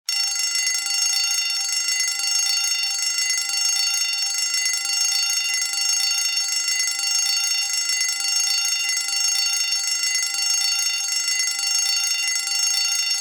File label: Fire alarm bell emergency steady ring long